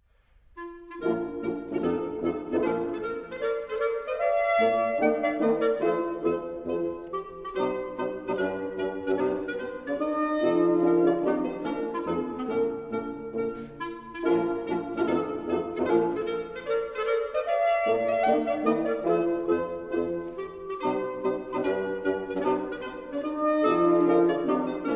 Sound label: March for 2 Oboes, 2 Clarinets, 2 Bassoons &2 Horns in Bb; Wind octet; Beethoven; WoO 29